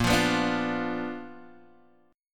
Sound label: B Minor Major 7th Flat 5th, chord